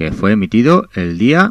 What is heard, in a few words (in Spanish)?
jingle reemisiones; soma